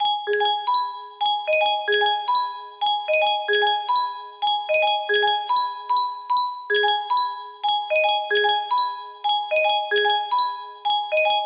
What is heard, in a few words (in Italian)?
melodia, music box